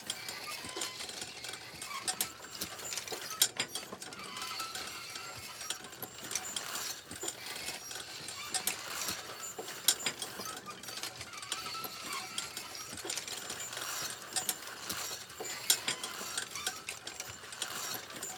wheelchair loop; inmate

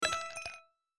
Chip Destroying; my cute commissar 2020 gamerip

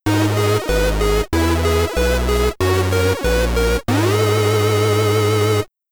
Stage Clear!; Eskimo Games; commodore 64

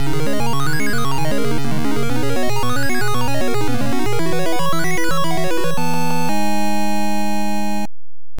Drops of Fountain; Toujin Makyou Den Heracles no Eikou; Family Computer

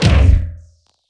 hollow big

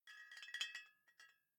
Bottle Shake; Devour; The Town; devour 2021 gamerip pc macos

